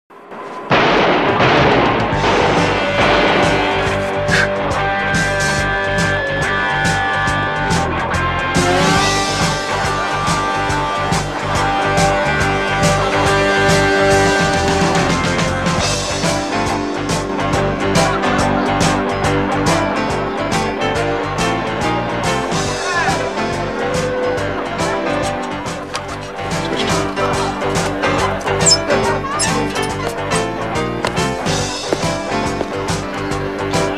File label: jam; charity ball